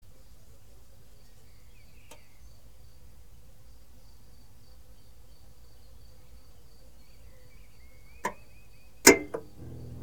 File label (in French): start stop chaud, climatisation